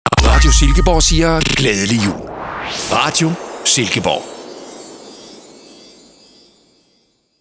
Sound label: Xmas ID